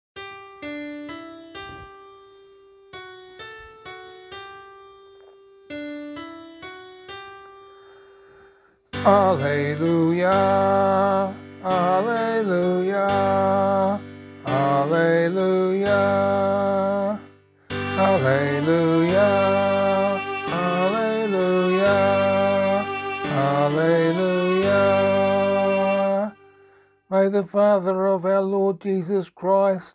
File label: Gospel, vocal